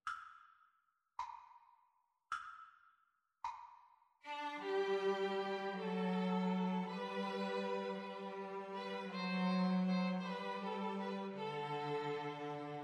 String trio

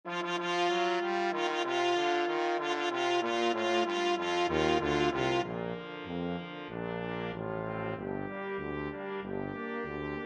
anchors aweigh, brass quintet

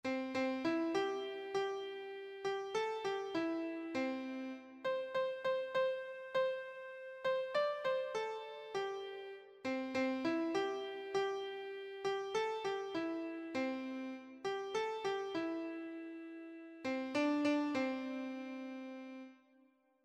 to follow jesus, keyboard

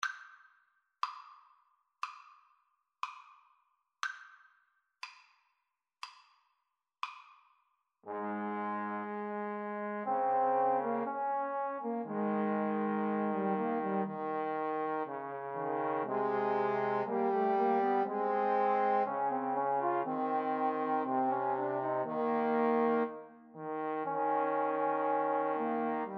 romance, Trombone Trio

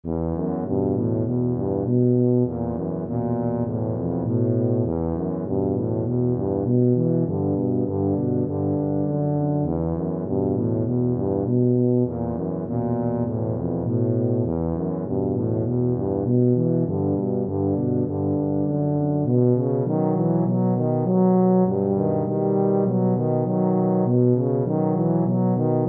mountain king 3 TBA, tuba trio

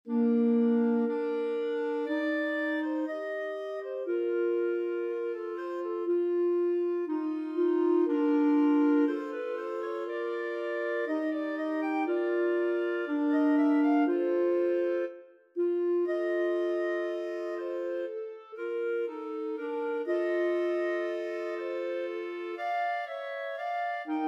romance, clarinet trio

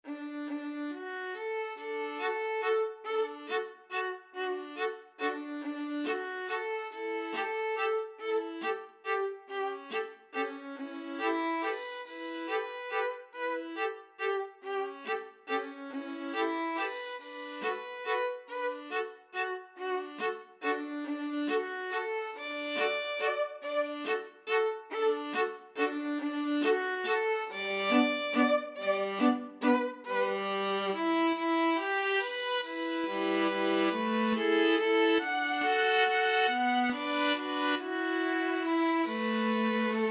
violin viola duet

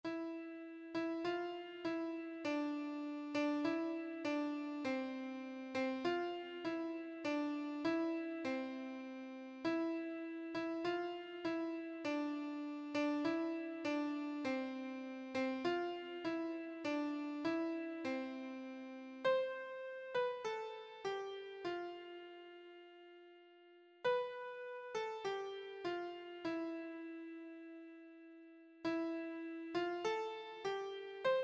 all to jesus, keyboard

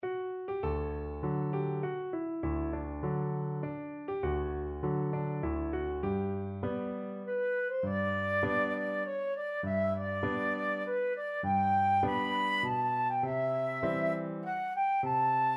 rolling home, flute

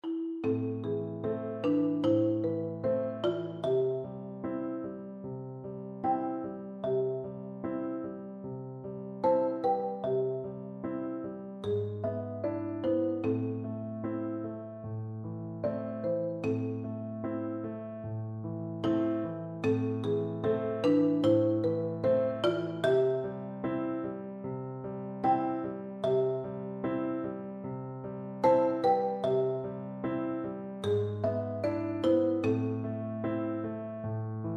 el condor passa, percussion

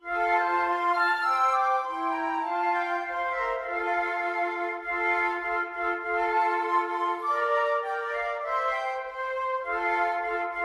early one morning, flute trio

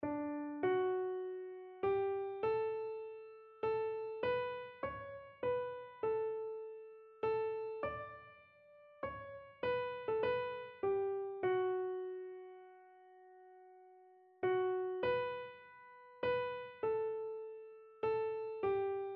great creator, keyboard

